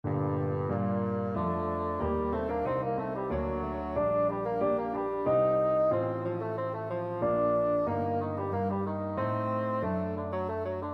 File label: purcell rondeau, bassoon